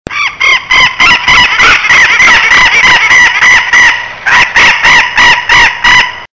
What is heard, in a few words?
austral parakeet